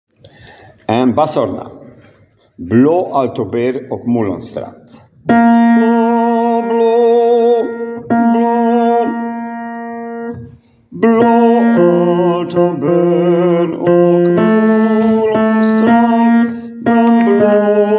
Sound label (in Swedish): bas